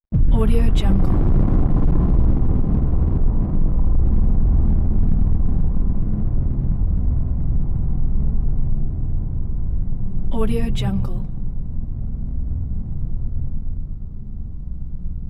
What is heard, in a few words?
underground medium explosion